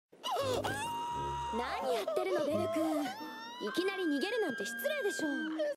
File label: bells scream; danmachi